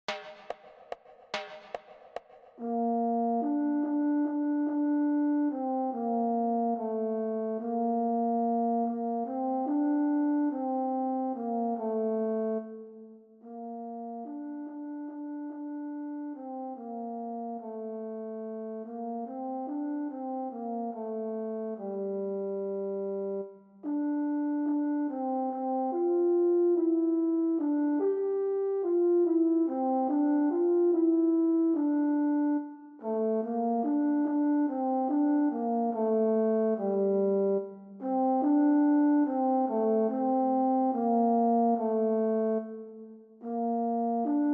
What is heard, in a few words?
horn 144; Ensemble Pourtant; si je brunette